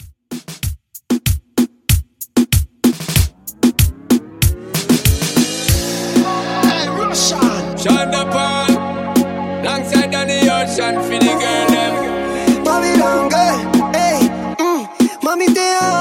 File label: Moombahton 95Bpm